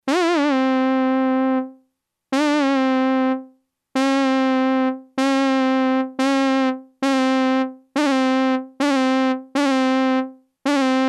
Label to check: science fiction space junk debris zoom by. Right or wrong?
Wrong